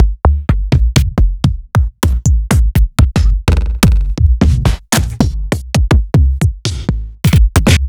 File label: Sub Perc